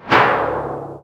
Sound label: tympany